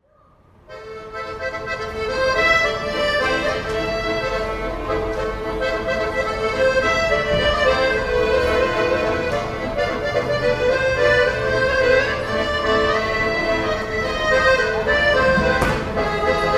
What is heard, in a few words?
Accordion player in Geneva, Switzerland